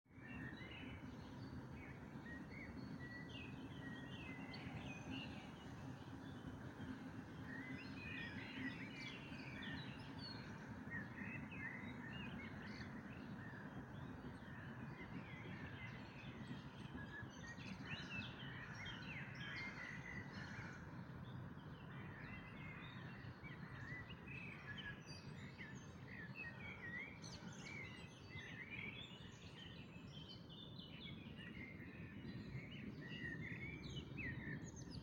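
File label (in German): Dawn Chorus, Vogelgesänge vor Sonnenaufgang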